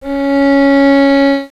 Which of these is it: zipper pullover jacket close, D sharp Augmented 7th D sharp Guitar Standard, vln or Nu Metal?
vln